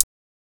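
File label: HH, hi-hat; X-Static Goldmine 1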